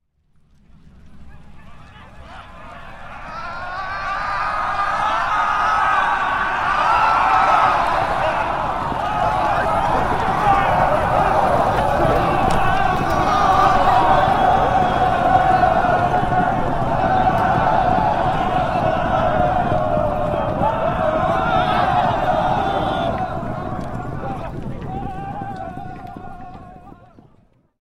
Infantry Charge 1000 Men; Historical; Hollywood Edge Historical Series; War Horses Armies & Weapons

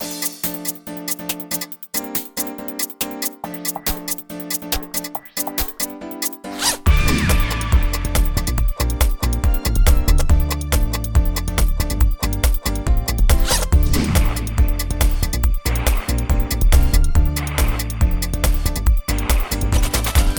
Plastic Bags

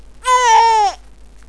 uoh

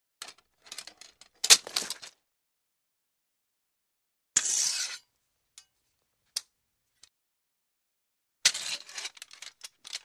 Sword Handling Sword Handle Rattle With Scrape; Hollywood Edge Foley Sound Library

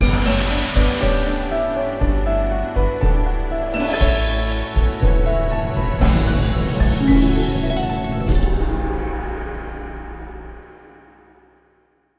Time check, music beds, affects and jingles

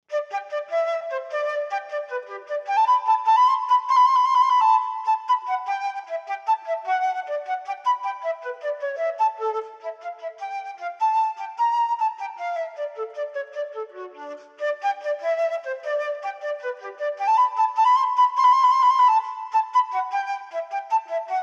Gigue, unaccompanied flute; Cello Suite no 1; Andy Findon; Johann Sebastian Bach

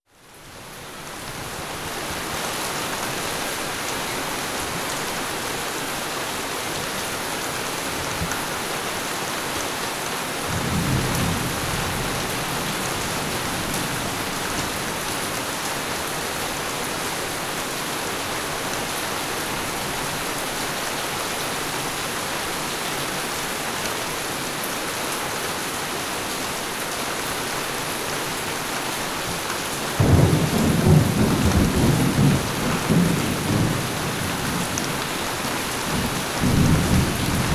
Steady Downpour With Thunder; Dr. Sound Effects; 210 Nature Sounds - 20 Hours of Relaxing Natural Ambiences for Meditation and Sleep

